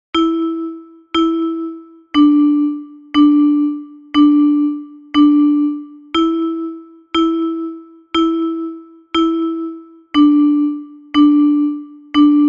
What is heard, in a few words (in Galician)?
Metalofono Contralto